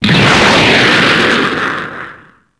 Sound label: chain swoop; DBZ